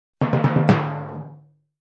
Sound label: Short timpani crescendo